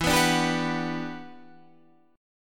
G Major 7th, E, Gmaj7/E chord; strummed; JGuitar

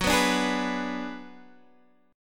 G sharp Minor 7th Flat 5th F sharp

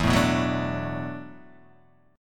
E 7th Suspended 2nd Suspended 4th, down

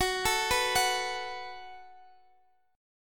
F sharp Minor Double Flat 5th, Guitar Open D; chordshape; down

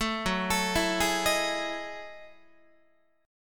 F sharp Minor 13th, Guitar; arpeggiated; JGuitar

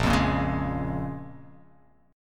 Bb-Minor 7th, Bbm7 chord; Bbm7 strummed

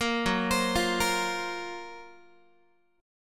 F sharp Major 7th Flat 5th F sharp, Guitar; F#M7b5 arpeggiated; 13,14,15,15,14,x; 4A,4E,5A,5D,5G#,6C#; chord shape

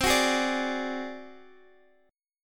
D 7th Flat 5th C

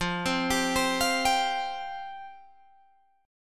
F Suspended 2nd F down Guitar Open G

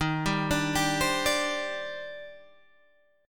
D sharp Major 13th, down, Guitar, Standard; arpeggiated; JGuitar

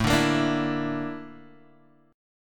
A sharp Major Sharp 11th, chord

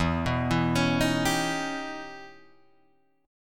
E Minor Major 13th E Guitar DADGAD

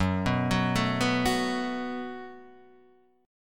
F sharp Minor Double Flat 5th, Guitar, DADGAD; chordshape; down

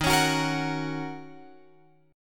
D sharp Minor 7th, Guitar, Open F; strummed; JGuitar

